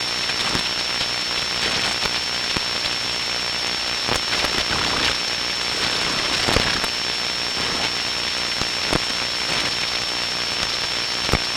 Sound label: uiglitch radio static lw radio static glitchgrains; roguewaves; Sonniss GDC